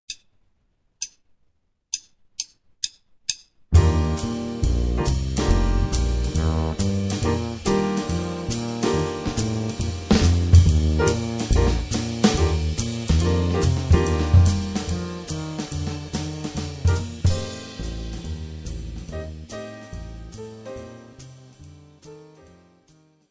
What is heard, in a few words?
jazz basic